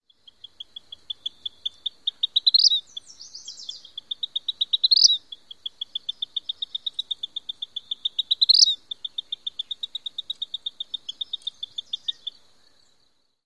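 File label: song and rapid calling, Vermilion Flycatcher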